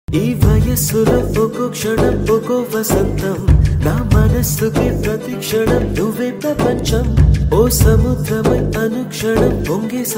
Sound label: telugu love songs